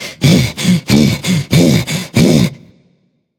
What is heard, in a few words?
breath; danzaburou